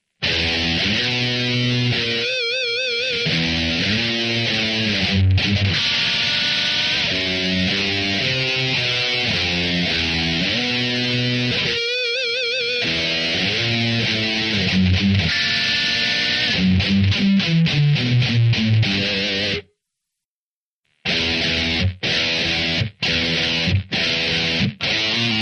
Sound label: Rock guitar; Total Rock gt